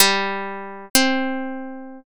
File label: biwa